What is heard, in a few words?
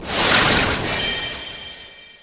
chime; raising heart; nanoha